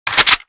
bolt pull

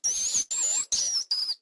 rat die

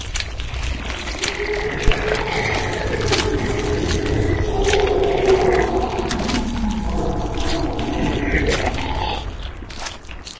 digest loop; sven; npcs; cthentaclhu; malanth

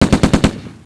saw fire

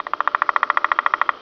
bugs, jungle

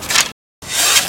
bow draw

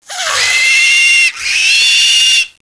spid die; eqclassic

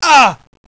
player pain

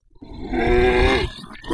zombie voice idle

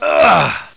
die

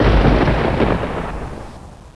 lightning; unreal

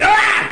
aagh

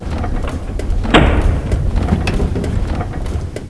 elev move

